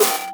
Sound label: snare industrial; osu